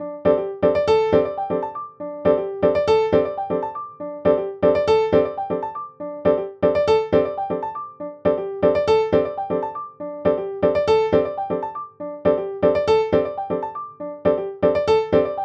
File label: c minor chord arp grid major quant